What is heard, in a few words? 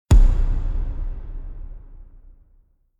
impact; Asteroids-2026